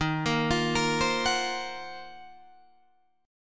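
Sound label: E flat Minor 6th Add 9th, Guitar, Open D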